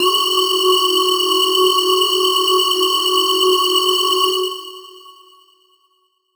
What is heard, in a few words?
Riddim Synth Hit F# Spoiled; One Shots; Ghosthack; Riddim Essentials 2020